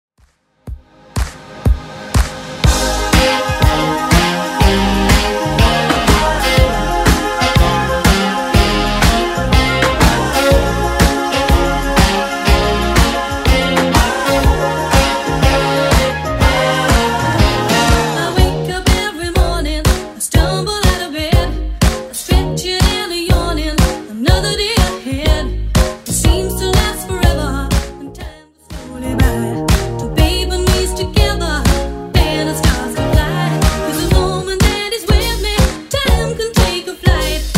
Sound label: 80s, 122 bpm